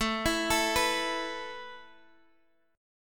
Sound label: A Suspended 2nd down Guitar Open D